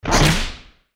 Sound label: launch; open toontown resources; target